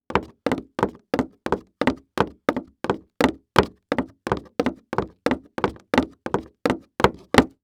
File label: Foley Footstep High Heels Shoes Jog On Wood; Foley Footsteps